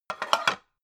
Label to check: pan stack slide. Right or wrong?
Right